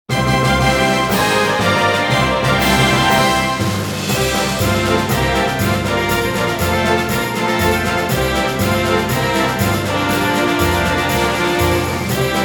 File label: Victory Fanfare; Final Fantasy XIV - Before Meteor